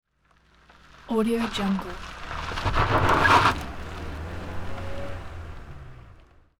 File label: car tires gritty peel